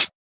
Closed Hihats; Cymbals; Vengeance - Essential Clubsounds Vol.5

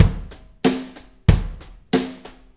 Brushes Loop, Full Kit Loops; Sonic Foundry (Sony Creative Software); Drum Tools

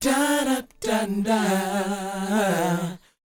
DOWOP D; ILIO; Vocal Planet

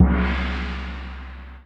CRASH, Drums Dance & Groove

